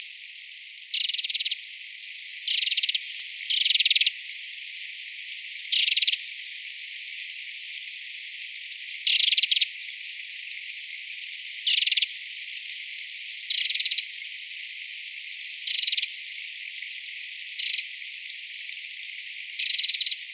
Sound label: Moltonis Call; Hugh Harrop